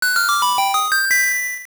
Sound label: Secret Long